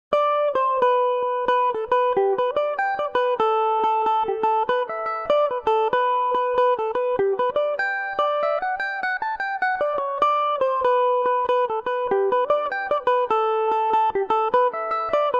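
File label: G major revelation, Irish mandolin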